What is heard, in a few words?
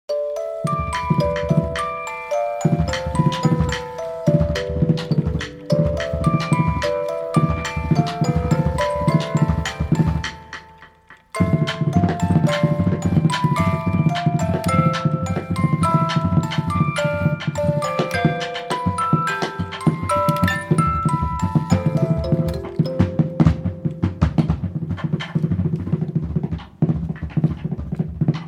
Whistle Baby